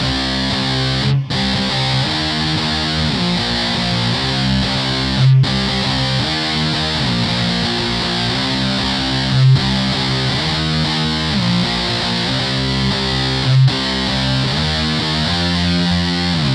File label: loops electric guitar